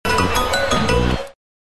musique du multiplex

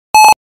bip caissiere

